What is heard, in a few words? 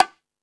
Jungel Percussion, E-MU Pro-Cussion; drum machine